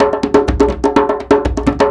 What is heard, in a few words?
Percussion Loops, Bongo & Conga; Houseworx